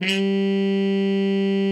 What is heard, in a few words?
G3, TRUMPET; Best Service ProSamples vol.42 - Session Instruments; PS-42 Session Instruments